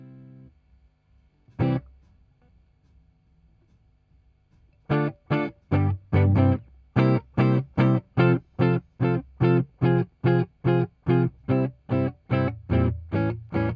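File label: Guitar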